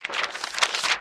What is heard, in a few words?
USE BOOK; EnvSound